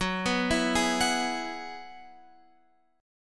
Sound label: F sharp 7th Suspended 2nd Suspended 4th F sharp down Guitar Open F; F#7sus2sus4 arpeggiated